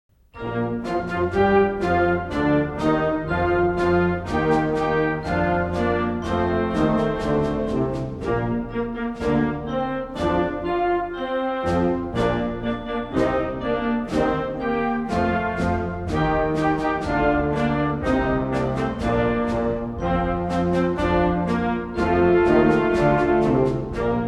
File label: march